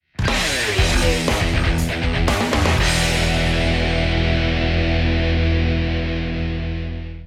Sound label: intensity stinger